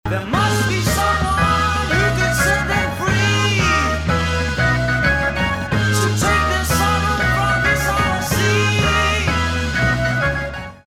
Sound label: Organ